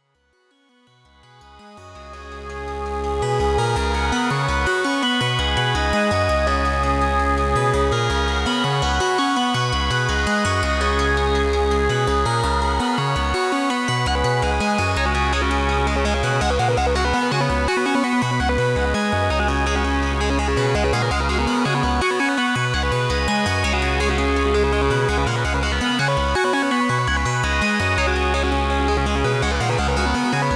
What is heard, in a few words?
modular; the velvet gentleman